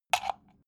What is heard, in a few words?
socket plug